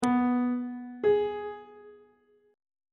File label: relative minor of C flat major is A flat on treble clef